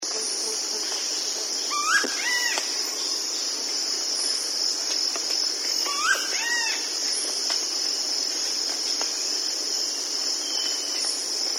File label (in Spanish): carpintero rojizo celeus grammicus